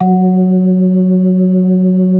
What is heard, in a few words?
B3 F#4; Best Service; Advanced Media Trax Modern Composer; B3 LES 1